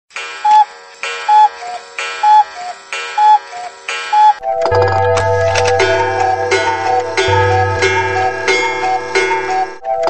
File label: Rooster Wall Clock